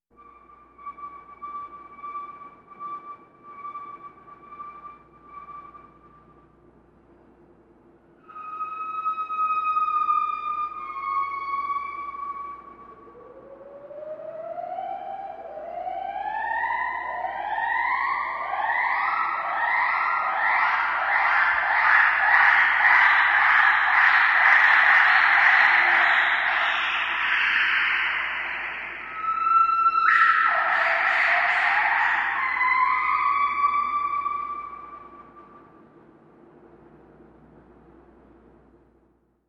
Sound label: Horror Ambience Scene